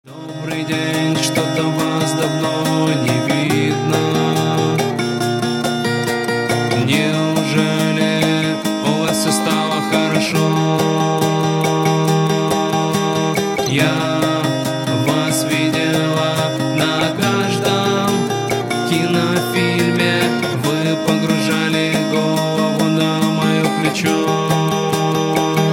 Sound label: acoustic